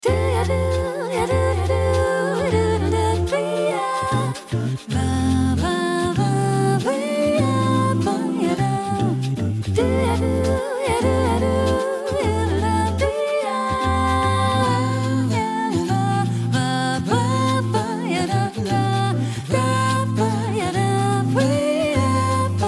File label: Summer Waltz; GD910